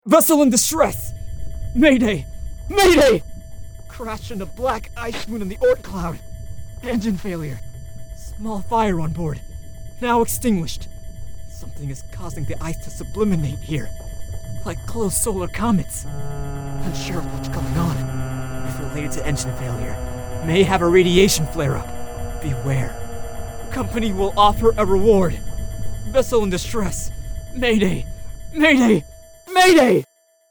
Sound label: Distress Signal